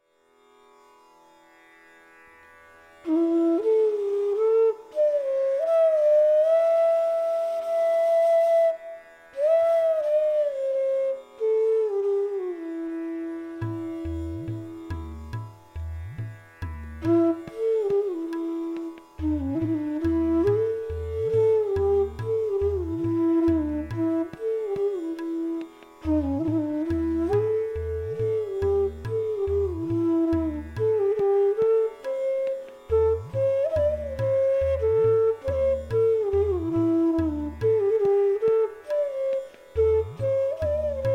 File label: Raga Malkauns Flute, Bansuri; Bansuri Student Zone